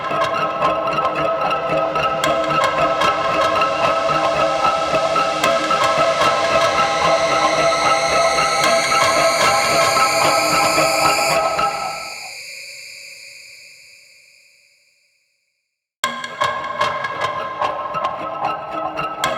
Cinematics Music; Warframe; New War

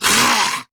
Melee Attack Grineer Ghoul Sargeant; Grineer Ghoul Drill Sargeant; Battle Barks

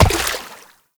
Step Water; Warframe; Uriel; Movement; Steps

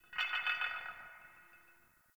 Exterior Man Hole Drag; Ambience; Warframe; Nineteen Ninety Nine

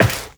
Step Metal, Footsteps, Walk; Warframe; Tenno

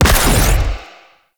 Void Angel Portal Beam Fire; Abilities; Warframe; Zariman

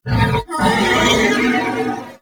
Horse Vocal Screech; Vehicles; Warframe; Duviri

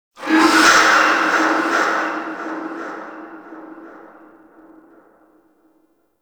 Stadium Infested Medium

